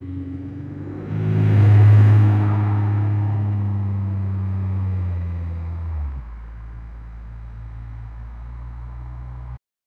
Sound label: car drifting